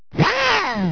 burzer

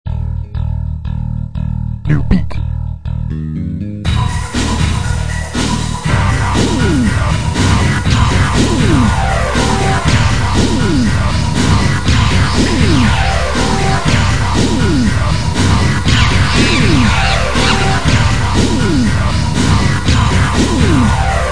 New Beat

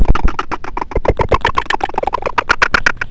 tiptap

